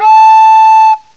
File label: KATO, WIND, CMI Factory